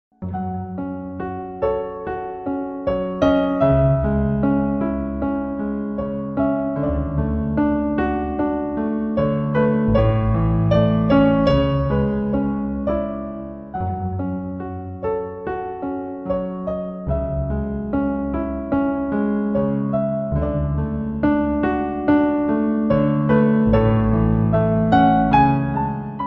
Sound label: Sad Piano